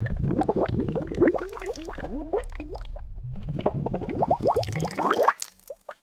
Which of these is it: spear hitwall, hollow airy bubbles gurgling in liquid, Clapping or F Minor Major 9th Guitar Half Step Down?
hollow airy bubbles gurgling in liquid